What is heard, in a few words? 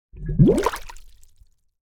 Air Bubble Underwater Bubble Surface - Short; Hollywood Edge; sound designer's toolkit v2